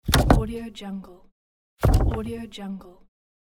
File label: loot barrel; gamechestaudio